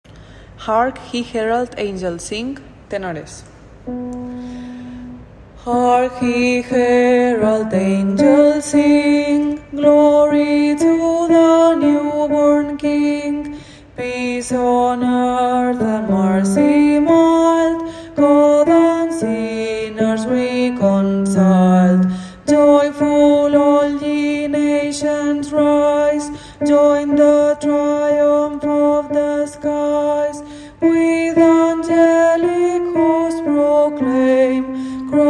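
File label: tenor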